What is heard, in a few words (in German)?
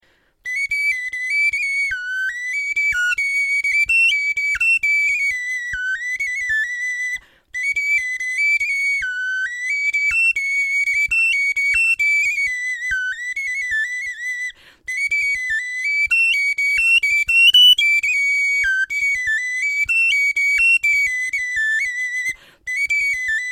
melodie, knochenfloeten